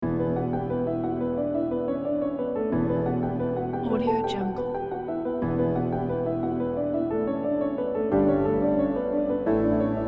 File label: documentary cinematic; klepokmusic